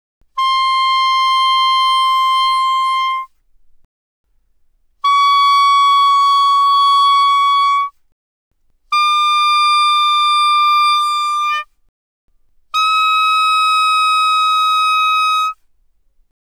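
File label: Soprano Sax No Vibrato ff C6 Eb6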